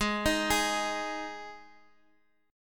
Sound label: G sharp 5th G sharp, down, Guitar, Half Step Down